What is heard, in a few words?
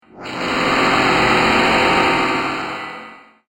Long Clang Industrial Horror, metallic sound effect